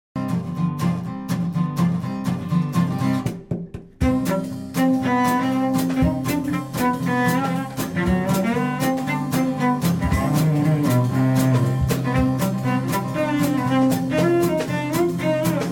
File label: music dirty cello